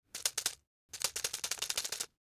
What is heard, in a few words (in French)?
crabe pas sable mouille